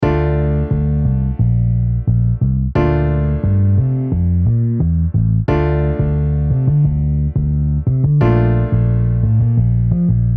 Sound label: Piano Bass MIDI